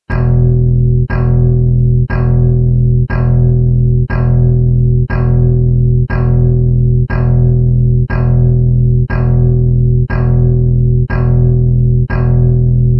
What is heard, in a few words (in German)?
E1 Ukulele Kontrabass